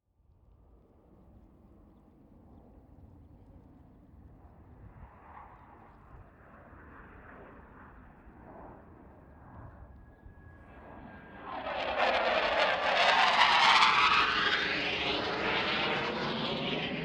fighter by long in long out, Jet Fighter Flyby, Long In And Out; Jet Fighter Flyby , Long Approach And Long Tail Out; Airplanes; Vehicles